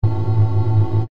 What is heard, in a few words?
science fiction electronic door open